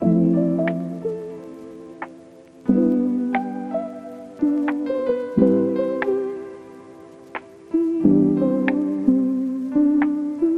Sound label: Rainy Day Reflections A Lofi